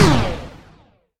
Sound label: Heat Beam One Shot